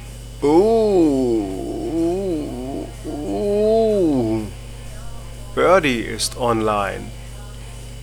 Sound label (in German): birdi